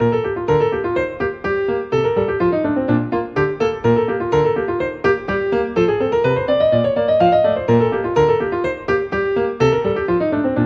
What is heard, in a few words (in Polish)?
lot owada utwor instrumentalny